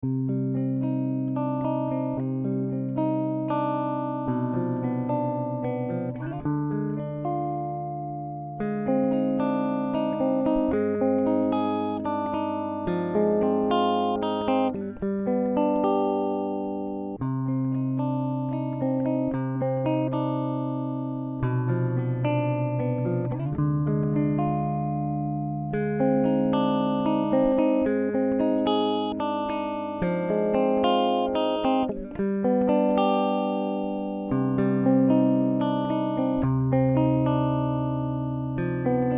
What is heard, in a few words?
Blues